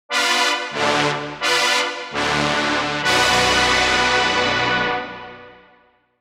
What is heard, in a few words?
brass chords